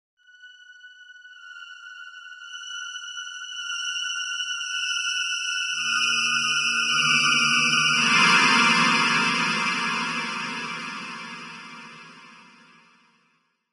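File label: Intrigue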